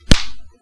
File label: Punch sound